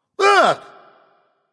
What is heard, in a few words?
surprise; smite; voicepack; khepri